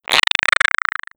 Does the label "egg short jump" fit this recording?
Yes